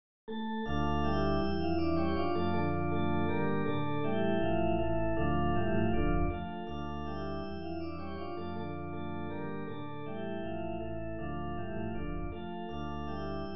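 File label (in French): bourrée mineur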